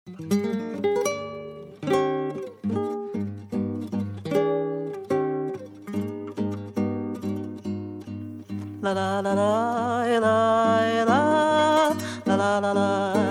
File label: guitar